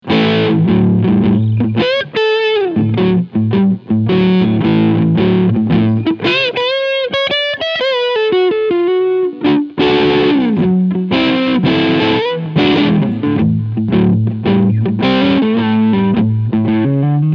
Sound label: FGN Neo Classic LC20 Crunch Sound Neck Humbucker